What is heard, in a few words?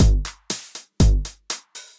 Kit 909; Drum Machines; Effected Drum Kits; Ableton; Factory Packs